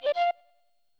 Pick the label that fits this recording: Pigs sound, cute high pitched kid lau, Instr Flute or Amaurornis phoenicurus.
Instr Flute